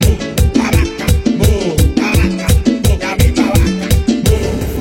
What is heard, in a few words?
dominican music